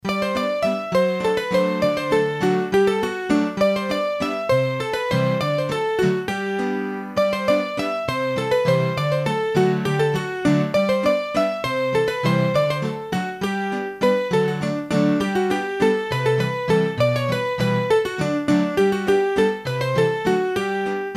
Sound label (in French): Bourree, hurdy gurdy; Massif Central; Bourrees a 3 Temp